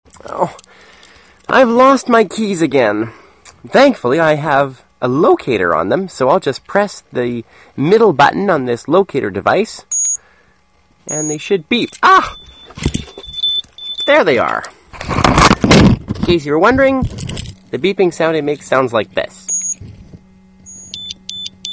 object locator

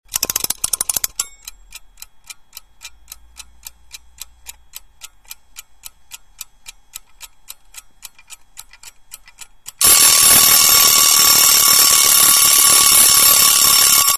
tactile timer

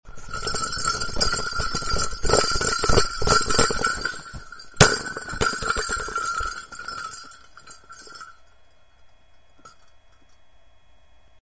bell soccer ball

